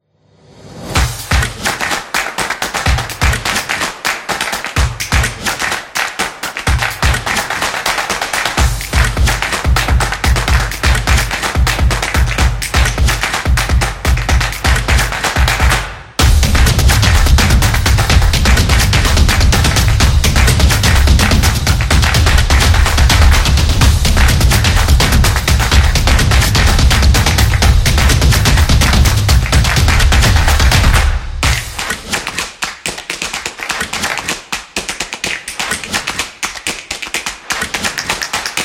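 Food Dynamic, Sport percussion background music created for action, competition; sports beat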